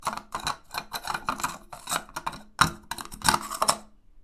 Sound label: wooden box